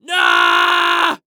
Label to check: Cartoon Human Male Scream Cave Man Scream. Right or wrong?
Right